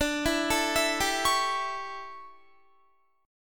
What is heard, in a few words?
D Major 7th Suspended 2nd Suspended 4th D down Guitar 4E 4A 5D 5G 5C; DM7sus2sus4 arpeggiated